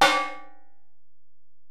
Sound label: Ac Perc, Drum Machines Alesis DM-PRO